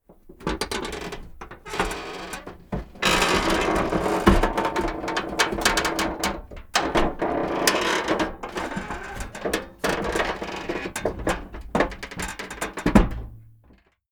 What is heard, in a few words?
metal creak stress medium